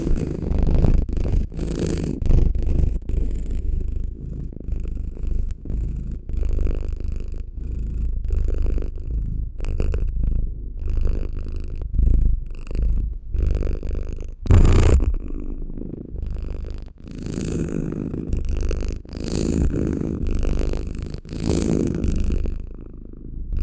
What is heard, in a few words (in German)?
katzen schnurren natrlic